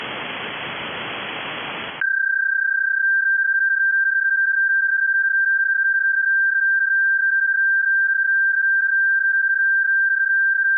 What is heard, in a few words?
TX PSK31